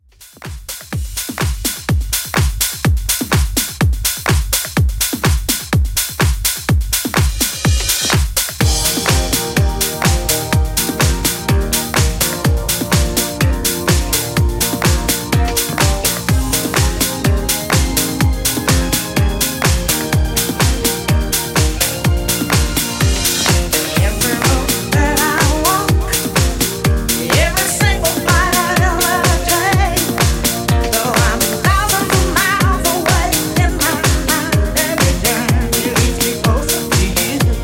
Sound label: 80s Redrum 125 Bpm Clean